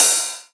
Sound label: Ride, Rides; Electronisounds